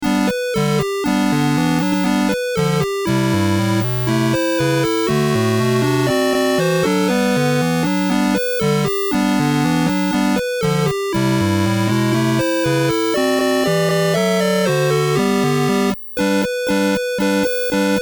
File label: Jingle Bells, Christmas Carols; 27 Christmas Carols